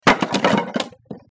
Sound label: wood fall